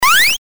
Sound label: jump